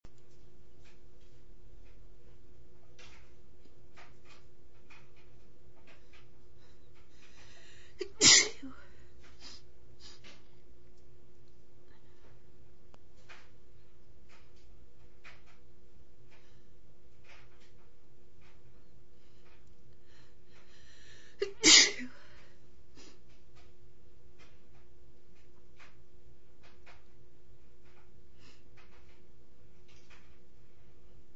dryer noise

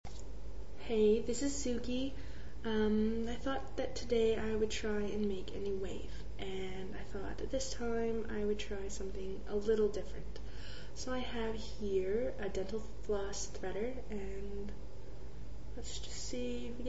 dental floss, female

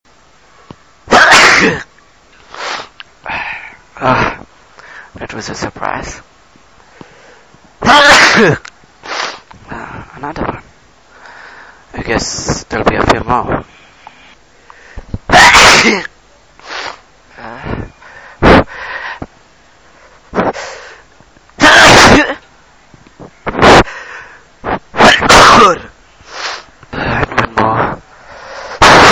natural, male

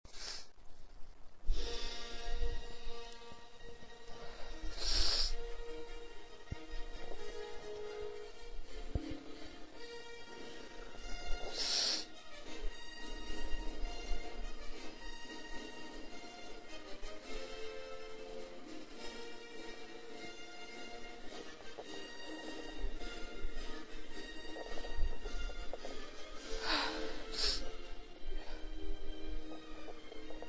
galactic sneezes, female

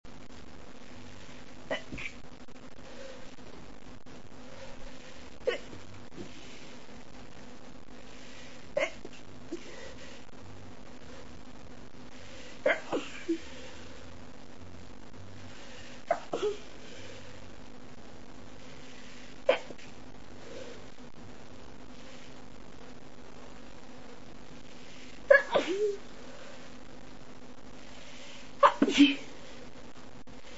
stifles shhh, female

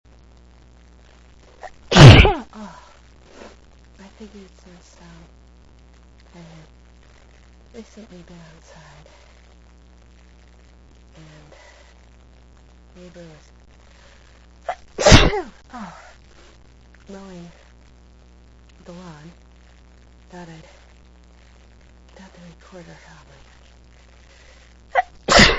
mowing, female

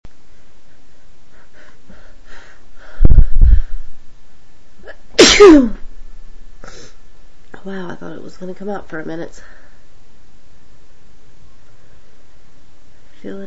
sneezy, female

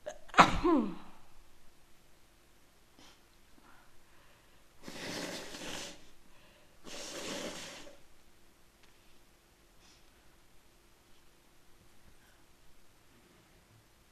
sneezy cold, female

